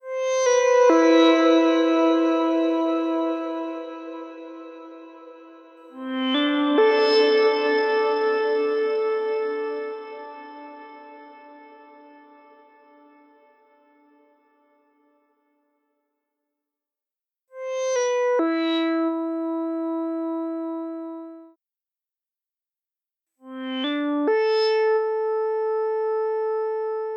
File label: Mono Synth; Glas Mahal; Temperance Pro